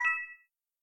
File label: Mission Collect; In Mission Collect